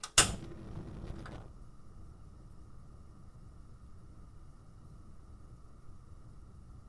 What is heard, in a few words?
stove burner ignition